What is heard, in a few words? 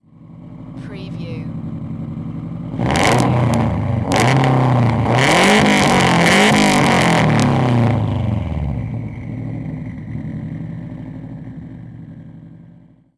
SUBARU STI EXHAUST MULTIPLE REVS